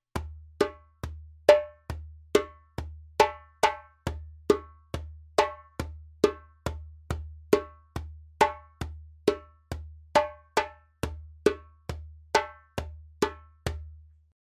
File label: djembe; djembebog oevelse